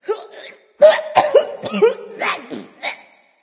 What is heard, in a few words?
clear throat; smite; aphrodite